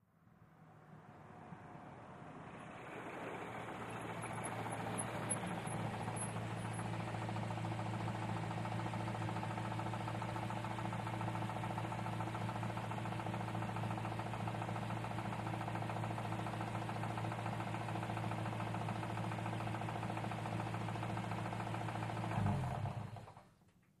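Buick, In Slow, By close up, Stop Medium Distant, Idle, Off, antiques; 1938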